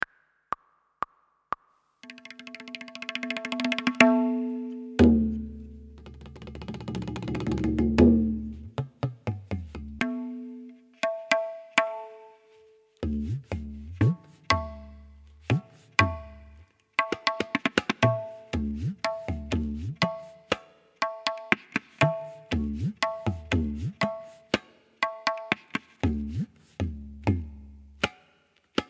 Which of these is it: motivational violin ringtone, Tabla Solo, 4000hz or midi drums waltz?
Tabla Solo